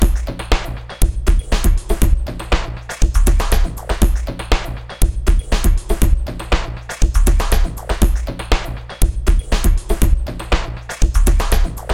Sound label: drumloop highs